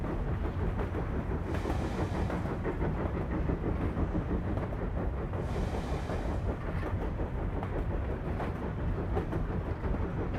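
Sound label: Scottish train south evening; The 39 Steps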